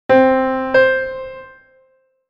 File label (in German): Intervalle sukzessiv Beispiel